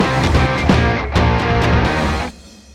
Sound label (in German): Soundlogo Rockband; Gaffel